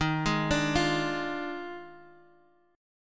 Eb Major 7th Suspended 2nd Suspended 4th Eb Guitar Half Step Down; EbM7sus2sus4